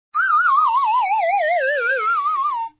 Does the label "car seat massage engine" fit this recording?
No